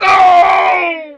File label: Spade Guard Trumpy Die